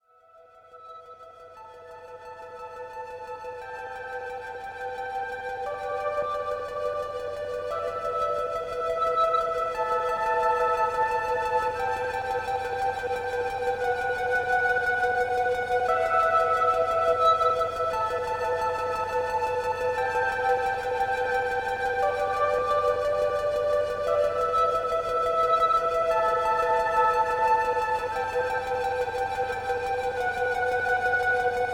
Airfield Music Stutter Melody Theme; Battlefield 4

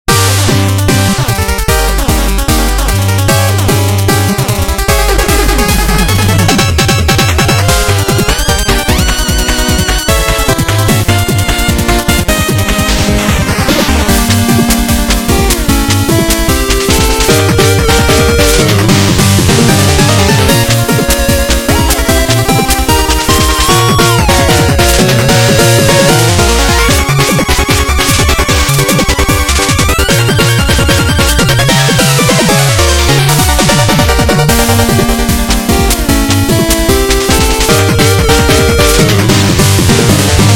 Music Boss; KK Boss Percy; Shovel Knight King of Cards

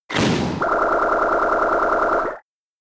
Drain Punch; attack moves; Pokemon; Gen 4; DPPL HG SS

